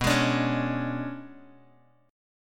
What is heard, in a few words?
B Minor 6th Add 9th B down Bass Standard; Bm6add9 strummed; JGuitar